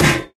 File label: physics turret impact md